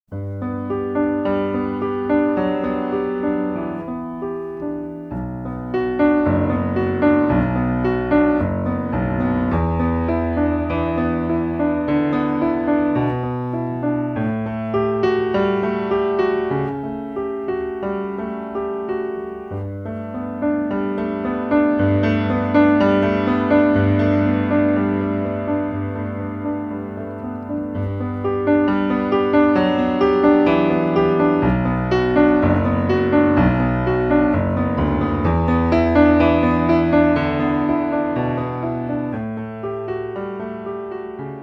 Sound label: Piano Interlude